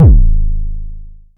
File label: analog attacked, Kickbank